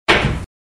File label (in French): billard